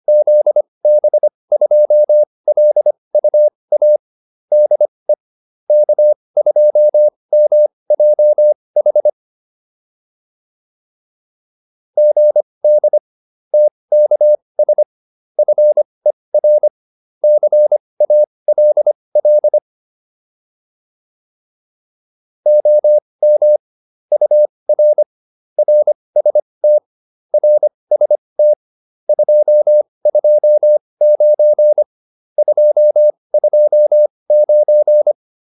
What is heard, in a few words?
QSO, intermediate practice files